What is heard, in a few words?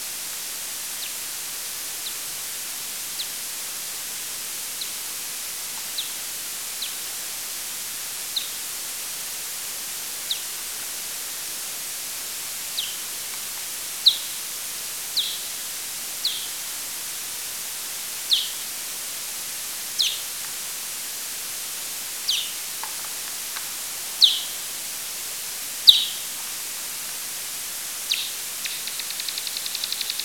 M blythii